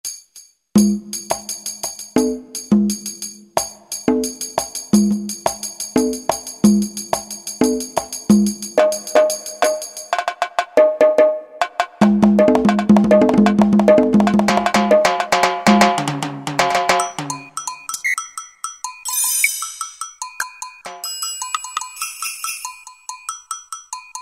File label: LATIN, Roland SN-U110-02 Latin And F.X. Percussions